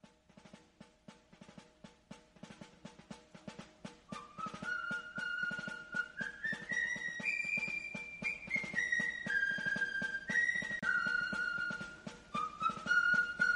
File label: Whistling